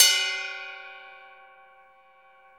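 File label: Cymbal Ride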